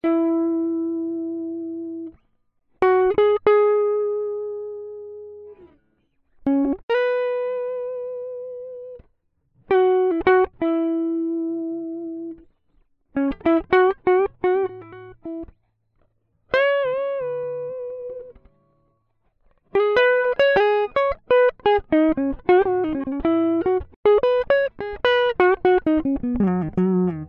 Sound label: Jazz Guitar; Ueberschall Jazz Guitar